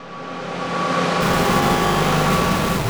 TECHNO FX 140

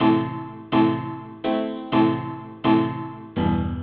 DEEPHOUSE ORGAN 125 A

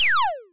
Vid G Slide

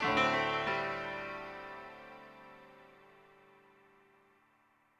ETHNO 90 D

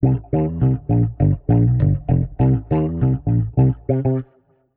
100 C, Warped Bass; Loops; Touch Loops; CosmicFunk

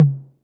808 MD-TOM, TR-808 DRUMS

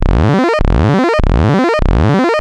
ARPEGG BASS; ELECTRIC-SET

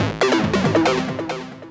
TECHNO EXTRA 140

